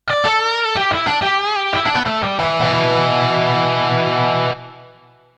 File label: Heavy Guitar